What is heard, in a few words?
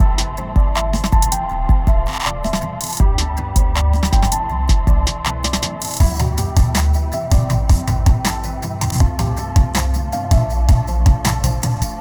Absolute 80s, free loops